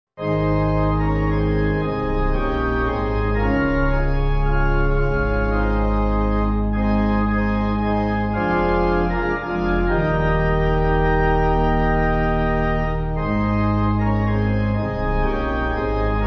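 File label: Pipe Organ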